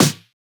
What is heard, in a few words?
Snare